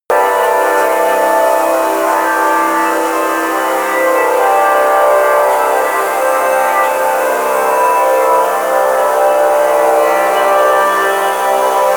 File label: drone buzzing